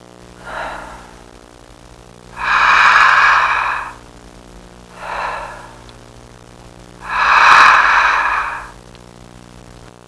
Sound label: DEEP BRS, russare; persone